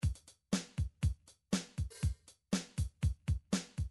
drums, midi drums

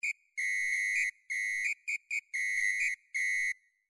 individual drums whistle, midi

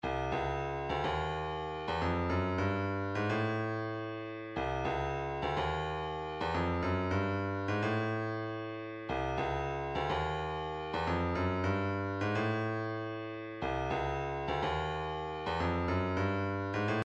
106 kick, midi

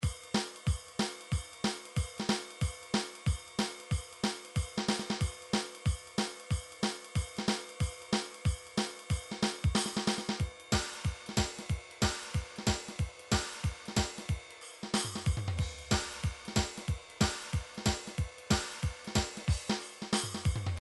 drum midi files rock

